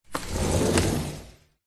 window sliding open; zapsplat

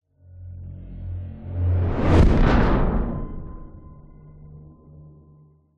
woosh dark science fiction droning thud impact; zapsplat

